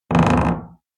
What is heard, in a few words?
Door squeak creak; Devour